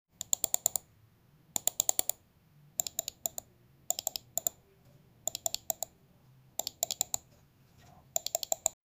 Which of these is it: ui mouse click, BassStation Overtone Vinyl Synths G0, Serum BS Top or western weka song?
ui mouse click